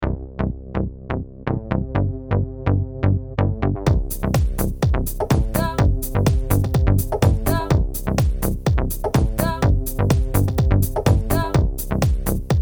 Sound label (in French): Rythme House